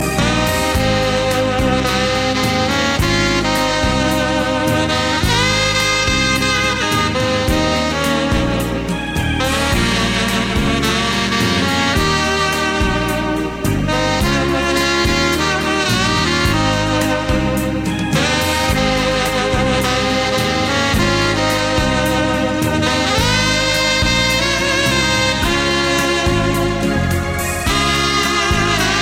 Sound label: Romantic Saxophon